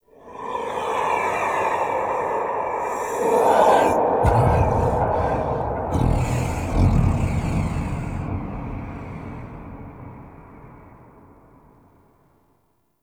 Sky Breaths; Ambience; Warframe; Duviri

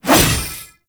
Whoosh; Warframe; Weapons; Tenno; Revenant; Nikana; Tatsu Prime

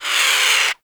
Avichaea Tranq Dart Grunt; Hunting Critters

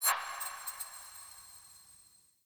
Choice Menu Hover, UI; Warframe; The New War; Table For Two

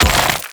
Stone Gaze Freeze Finish; Warframe; Warframes; Brawler

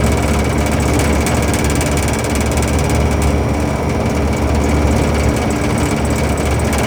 Air Loop; Props; Warframe; Tau; Ambience

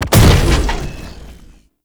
Tank Ram Ability Hit; Warframe; Lasrian Tank; Abilities

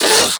Combat Vocal Pain; Warframe; Catbrow

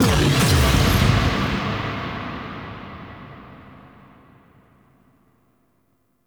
Attack; Leverian; Voruna; Warframe Powers

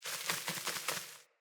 Sparrow Five Wing Flaps; Animals

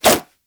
nunchuck whoosh; Warframe; Infested; weapons

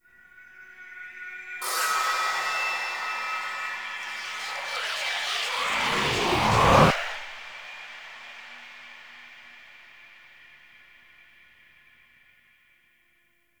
Void Echo Consume; Warframe; The War Within; Mountain Pass Return Peak; Cinematics

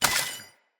Casting Device Drop, Weapons Tech; Enemy

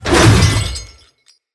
Sword Whoosh Heavy; Weapons; Enemy; Swords; Mnd King Swords

